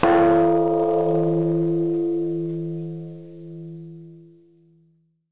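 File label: kane, bell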